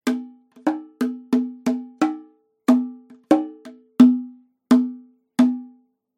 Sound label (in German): Holztrommel gr